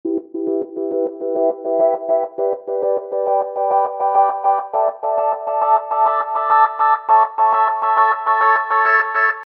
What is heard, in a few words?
Keys; PatchFactory